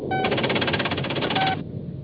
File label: mother typing